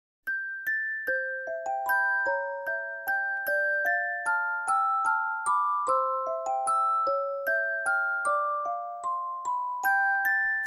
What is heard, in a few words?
waltz